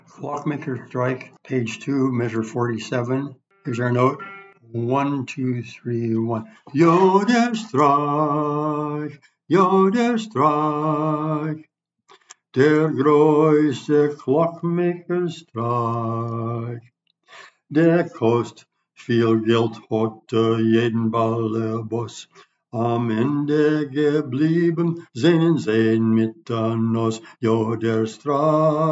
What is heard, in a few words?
bass; Cloakmakers Strike